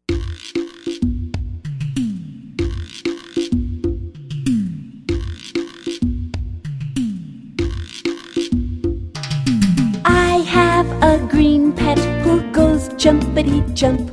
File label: jumpity jump